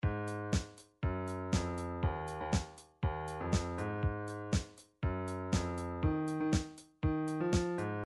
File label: midi drums motown